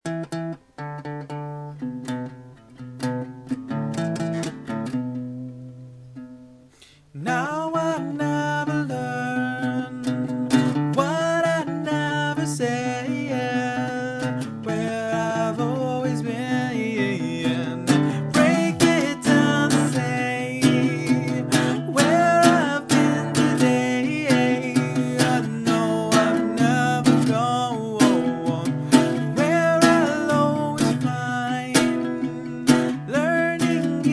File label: guitar